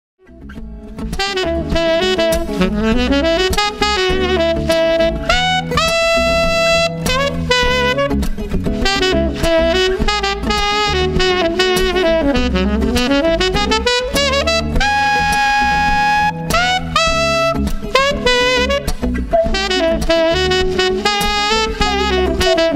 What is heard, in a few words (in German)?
Saxophonist Empfang Lounge Gala Pop Impro; Hannover